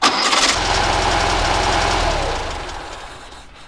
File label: start stop, vw